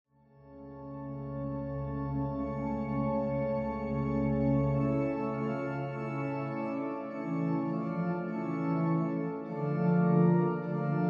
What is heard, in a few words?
Distant Organ; Drustvar; Waycrest Manor